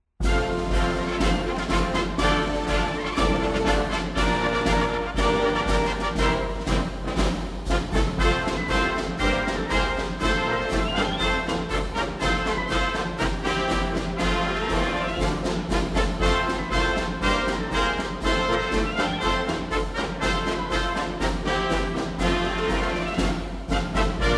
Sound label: marine hymn music